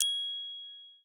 gloken

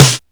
normal hit clap

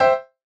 buildup G6 E6 C6

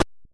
Drum; osu

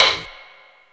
soft hitnormal

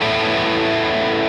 Arp.

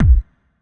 normal hit whistle

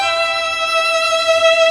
violin; osu

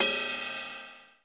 Ride Cup

